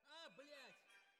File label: Timp Close